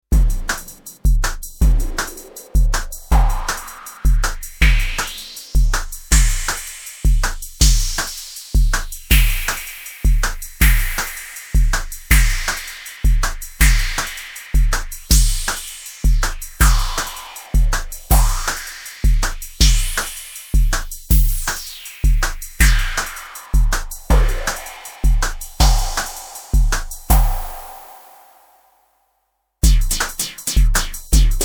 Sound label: Metal